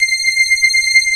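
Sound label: UHF strings C, Roland, Analog; USB Soundscan vol.49; Analog Fantasies; AKAI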